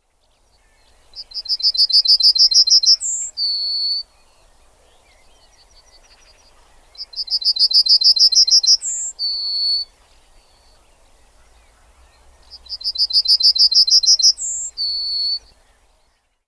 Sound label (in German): Goldammer